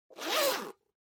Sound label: Pencil Case Close